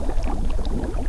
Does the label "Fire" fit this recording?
Yes